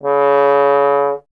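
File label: bass trombone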